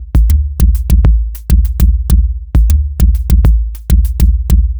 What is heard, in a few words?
Deep Groovin, Beats; Sonic Foundry (Sony Creative Software); Ma Ja Le Ethereal Textures